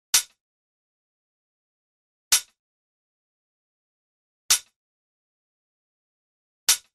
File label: Ambient House Hat 110BPM